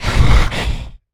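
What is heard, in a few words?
Stealth Attack Grapple; Evolve; Goliath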